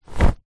foley item of clothing drop on floor

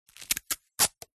office parcel tape peel from roll

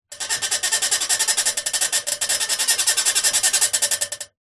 foley metal creak wobbly frame; zapsplat

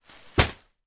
foley bin liner stuffed with clothes drop; zapsplat

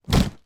foley parcel heavy down; zapsplat

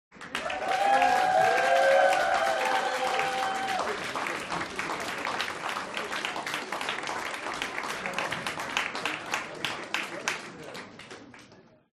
HUMAN CROWD Applause Small Audience Club Front; smartsound

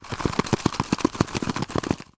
christmas cracker shake; foley; zapsplat

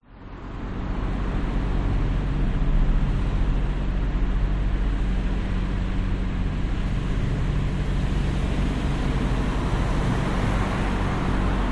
vehicles traffic city tunnel brisbane; zapsplat

